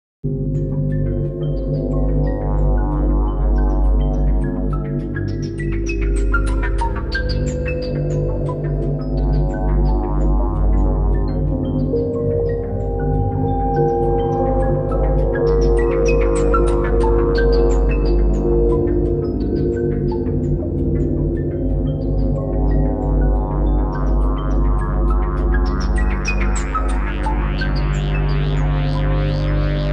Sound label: Chaotic Ambience; Katamari Damacy; Me and My Katamari